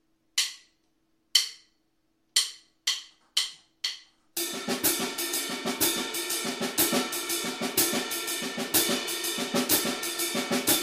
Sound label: dgddgg swing